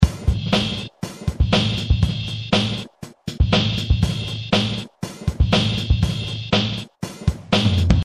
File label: Kit